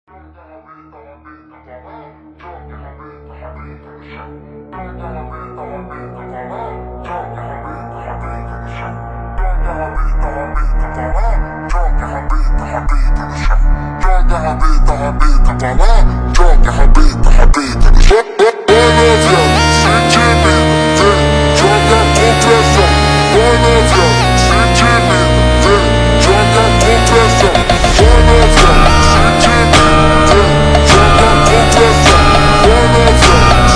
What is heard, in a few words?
FUNK - Super Slowed